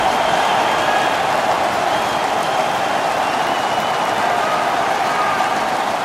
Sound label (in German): zuschauer starker jubel; national hockey league 2019